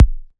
Kick, Dubstep 808 Kicks; GhostHack

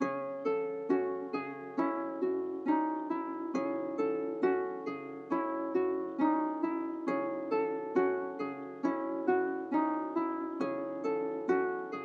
136 BPM C# Min Harp; Melodies; Loop Stems; Cymatics; Red Sea; PHARAOH Beta Pack; Pharaoh Premium Drum Samples (BETA)

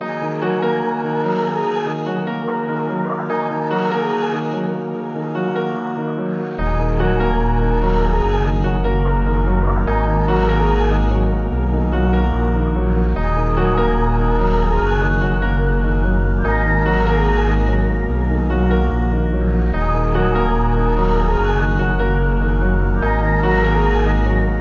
146 BPM D# Min, Trap Melody Loops; Powers; Cymatics; Nightmares Trap Melody Loops

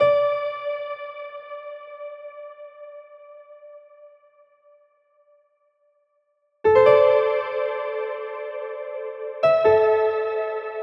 140 BPM D Min Piano, Speed House; Melodies; Loop Stems; Cymatics; GHOST (Beta Pack); Cold Blooded

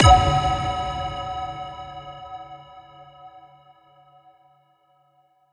Impact

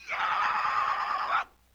LoFi Synthetic Squawk

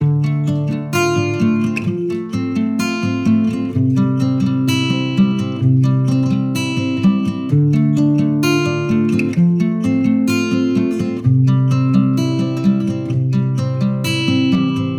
Acoustic Guitar Loop 128BPM Amin; Leads; Ghosthack

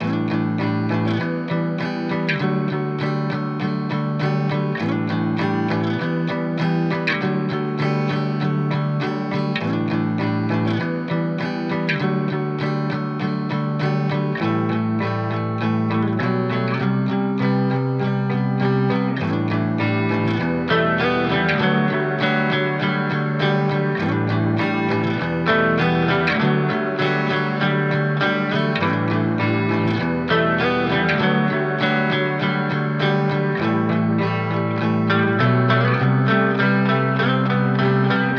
100 BPM E Min, Guitar; Winter; Cymatics; Miami Guitar Pack - Pt 2

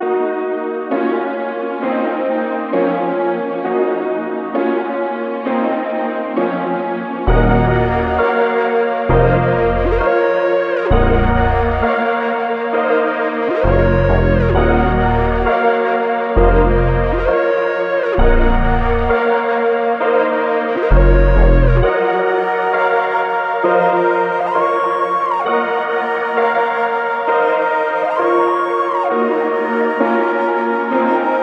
132 BPM A# Min, Melodies Analog; Cymatics; ZODIAC Beta Pack

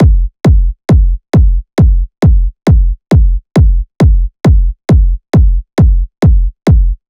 Kick Loop 135, Techno Drums

